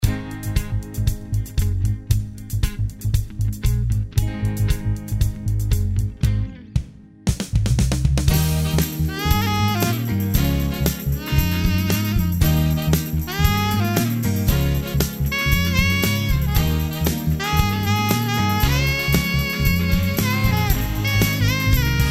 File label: Saxes No Intro